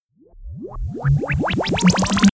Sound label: sweep